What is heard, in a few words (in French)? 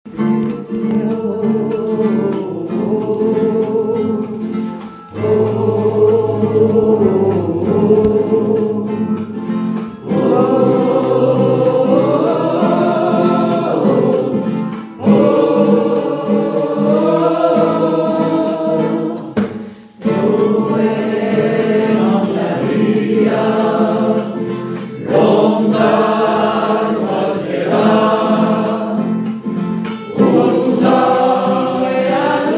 Campanero 3 voix